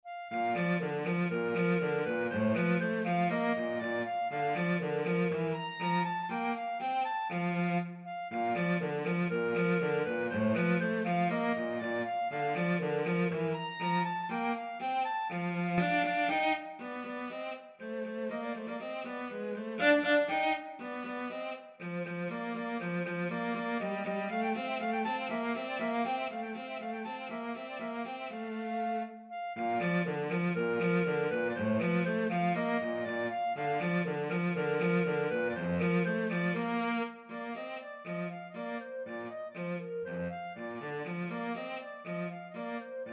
clarinet cello duet

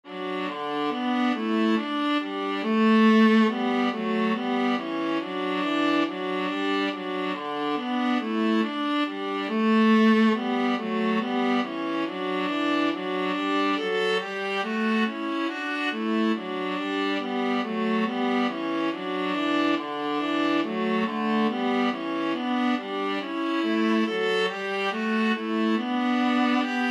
good king, viola duet

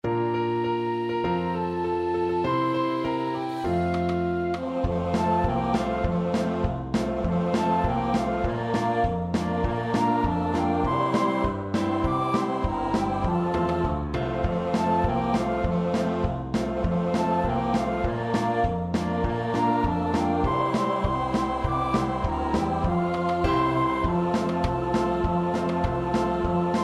jingle bells, 3 players and rhythm section

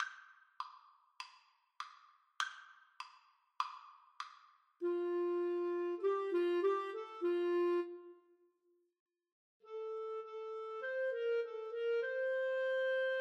Clarinet Duet

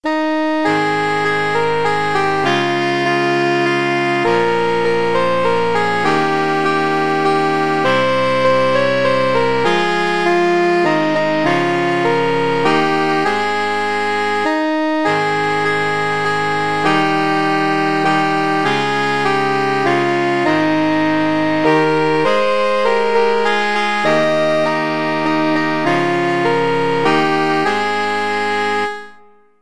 we wish you a merry christmas, saxophone quartet

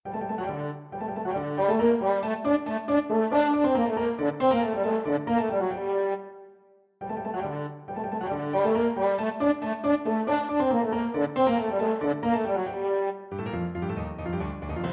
anvil chorus, french horn

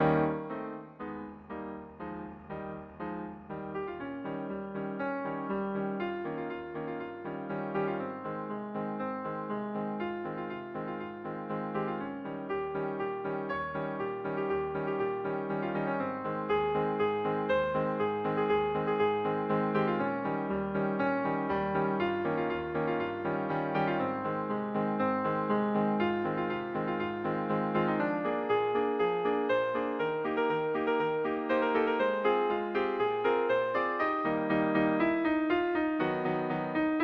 saxophone

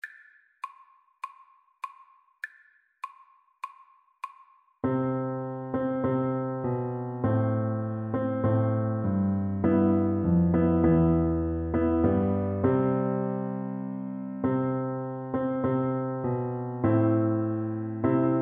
Oboe

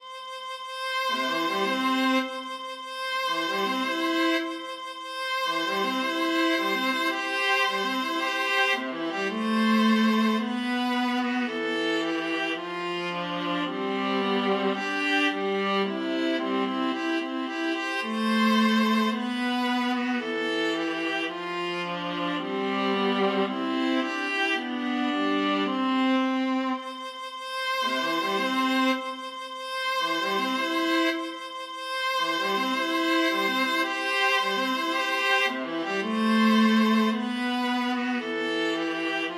viola duet; wedding march

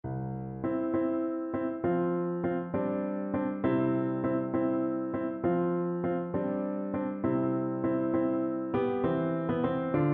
horn, French Horn, siciliano

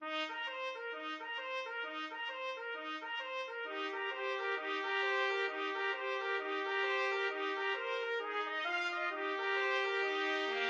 jingle bells boogie, trumpet duet

